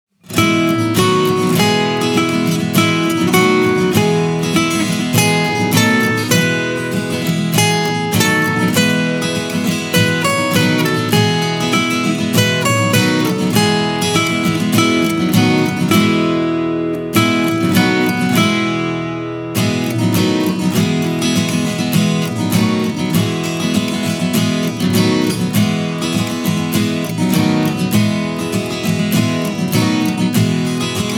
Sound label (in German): lied kleines rehkitz karaoke; ich und du; Schuelerzeitschriften